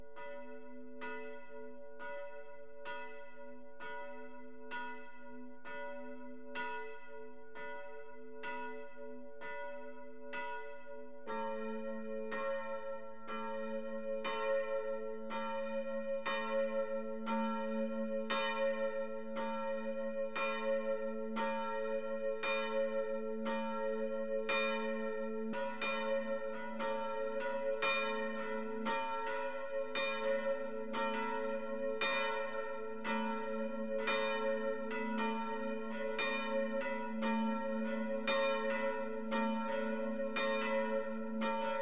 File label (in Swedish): klockor; mangskog